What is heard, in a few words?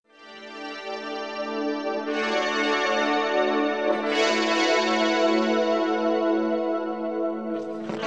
120BPM Guit Synth A